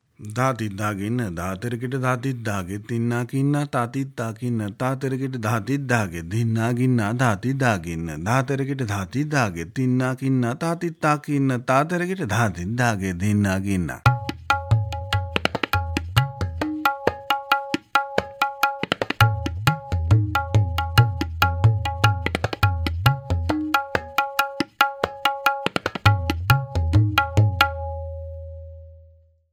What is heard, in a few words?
Dhatidhagi Nadhatirakita